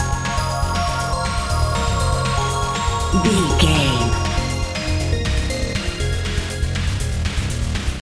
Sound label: intense challenge video game loop